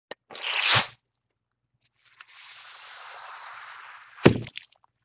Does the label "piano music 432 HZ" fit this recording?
No